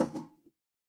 glass ceramic

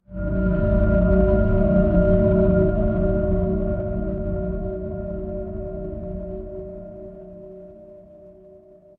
horror drone

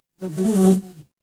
animal insect

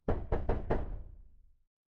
door knock

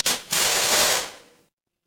fire electric fuse

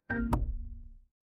feedback checkpoint

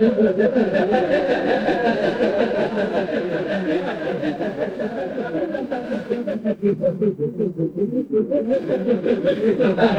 crowd laughter

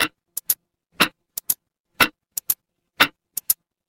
mechanical clock tick

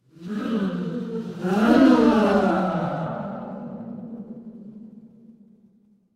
crowd gasp

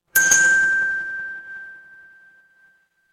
instrument chime bar

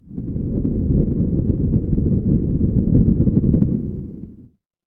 ambience thunder

